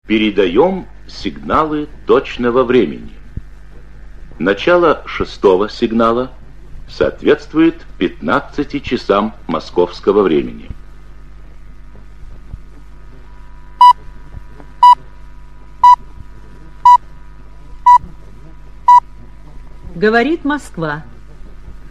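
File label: exact time signal USSR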